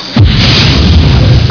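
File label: explosion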